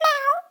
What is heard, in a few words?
meow; animals